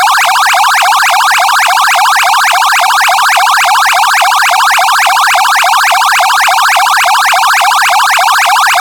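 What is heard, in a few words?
PIERCER ALERTB, LED warning bar; UltraLITE Plus Exterior LED Warning Bar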